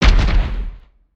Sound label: Spider Boss Jump Impact; creatures mechanical